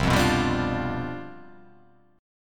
C Major 7th Suspended 2nd Suspended 4th C down

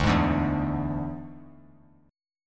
D Suspended 2nd Flat 5th D; strummed

